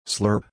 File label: slurp